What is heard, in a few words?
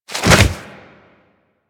roll; characters; generic foley; Warframe